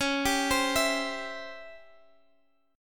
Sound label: Db Minor Major 7th Flat 5th Db down Guitar Drop D